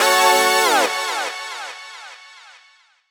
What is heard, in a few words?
dubstep club stab